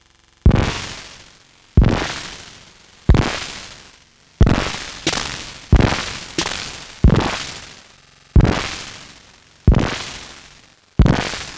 808 pitchshift feedback

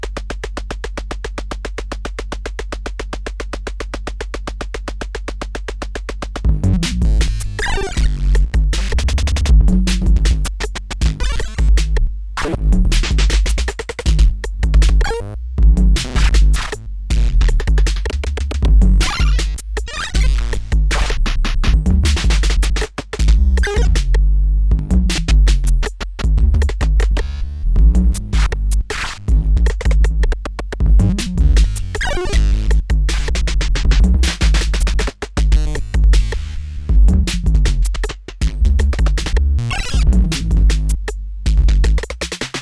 808 CV controled by doepfer

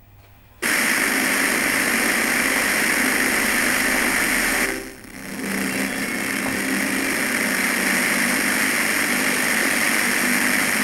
n64 audio data distortion, circuit bending

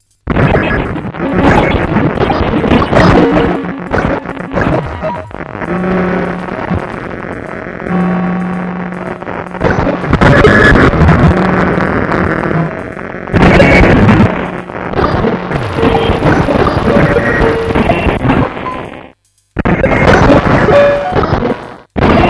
Casio SK1, circuitbending; Gijs Gieskes

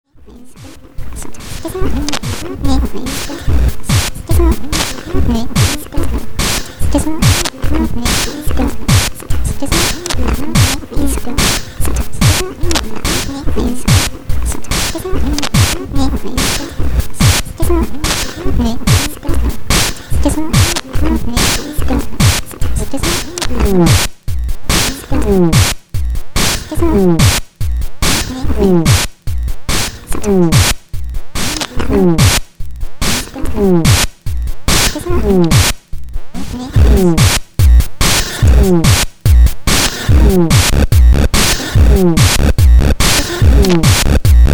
Seq Plugin and LSDj; Gijs Gieskes

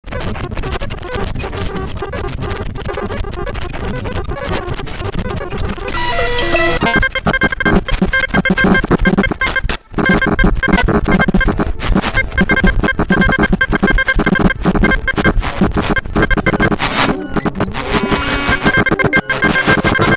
casio ct 380; circuitbending; Gijs Gieskes

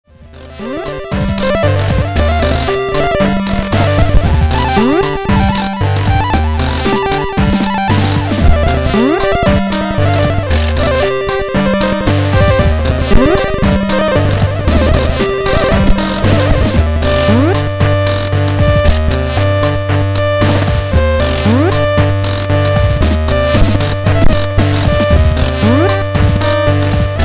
Seq Plugin and LSDj; Gijs Gieskes

